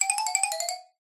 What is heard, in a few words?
cartoon xylophone descend fast; zapsplat